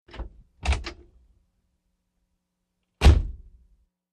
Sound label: Aston Martin Door; ext. doors